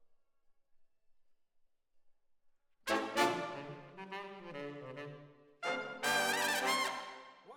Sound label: ORTF, Jazz for piano